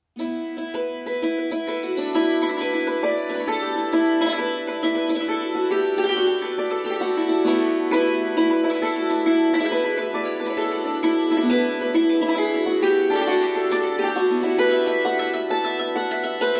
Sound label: Dulcimer; Celtic Dulcimer